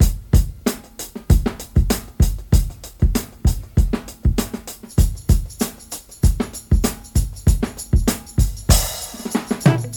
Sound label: 96 bpm breakbeat f key, kick tuned to the F note